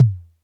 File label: Toms, Elektron MachineDrum